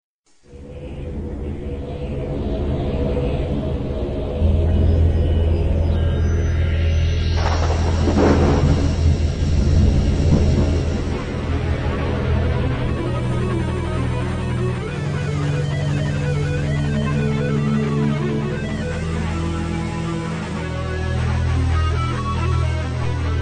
aeolian storm

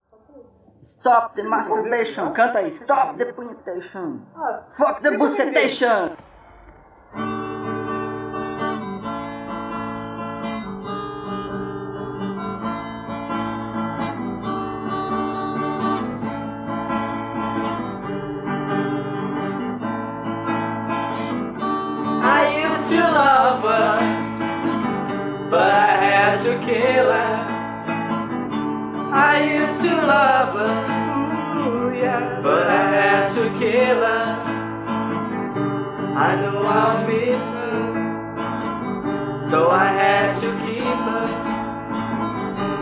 metal

